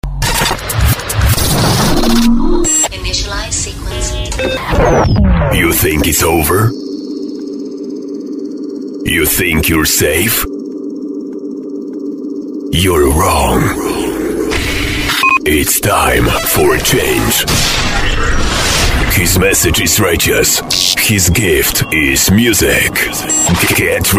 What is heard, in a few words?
trance mix, dance